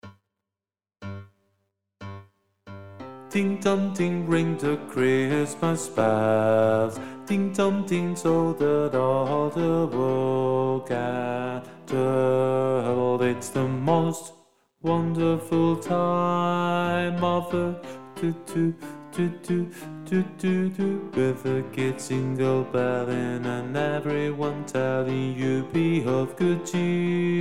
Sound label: ALTO, SHOW CHOIR; ADIOSES SHOW CHOIR; Navidad 24